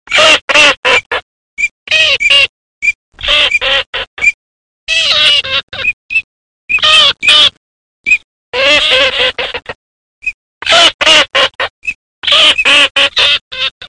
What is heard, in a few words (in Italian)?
alzavola